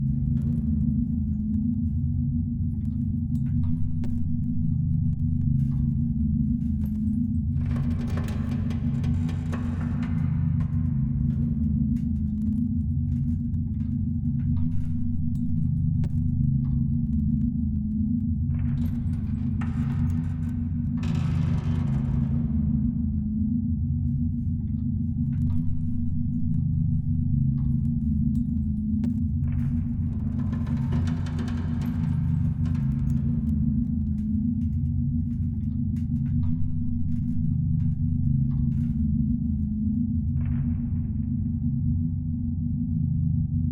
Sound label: ambient damaged generic station idle; Stellaris